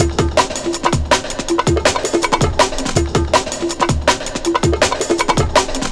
162, Jungle Breaks; Jungle Warfare 1&2

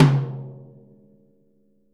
Living Drums; Sampleheads; Peter Erskine

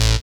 SYN DANCE; Sound & Vision - Gigapack II

